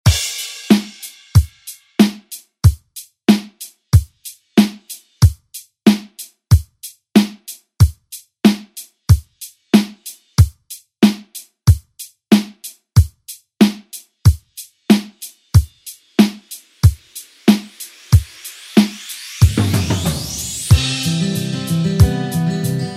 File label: 70s Re Drum; DJ Mhark